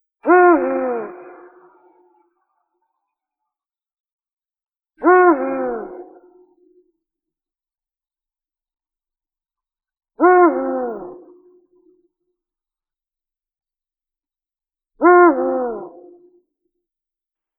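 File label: eagle-owl bubo bubo; filin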